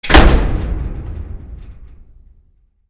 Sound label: DOORS